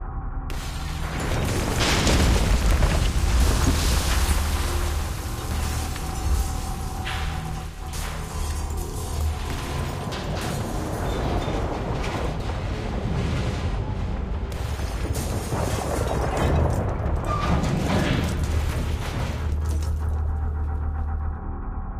collapsing building; Secret Agent

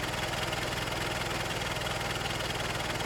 car idle loop; Film Noir SoundPad

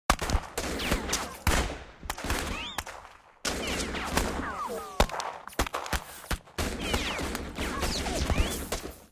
gun battle, backgrounds; Secret Agent

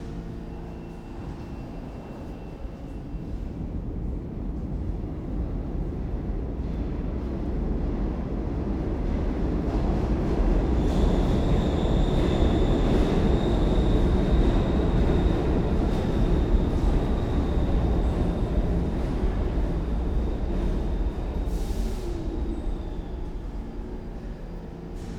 subway platform; Film Noir; SoundPad

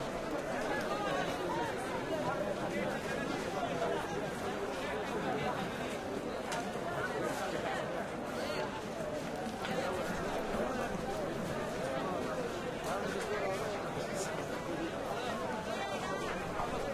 busy market, backgrounds; Secret Agent

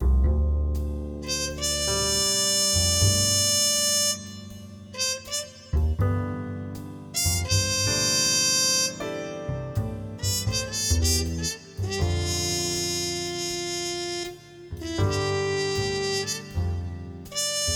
Film Noir music cool city loop

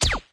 silencer; Secret Agent

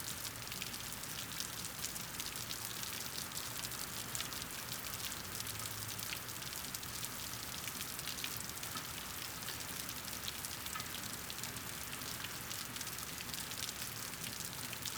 rain street loop; Film Noir; SoundPad

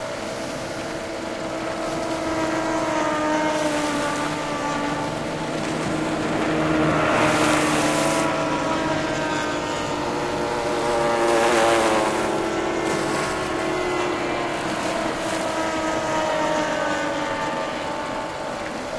boat chase; Secret Agent

